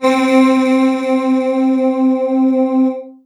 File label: Casio CTK-611 Halo Pad C4